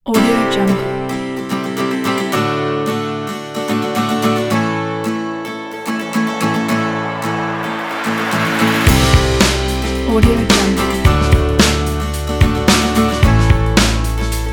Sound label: city life rock; musicmuseum